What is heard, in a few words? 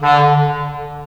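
wind clarinet; Roland - LA Composer vol. 3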